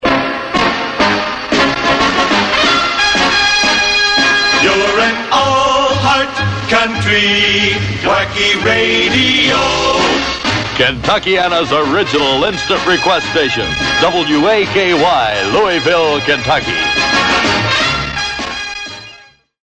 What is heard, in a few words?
All Heart Country Jingle; WAKY